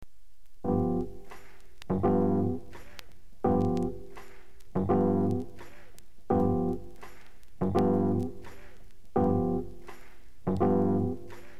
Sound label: bluenote